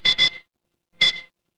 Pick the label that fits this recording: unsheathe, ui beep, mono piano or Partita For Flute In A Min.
ui beep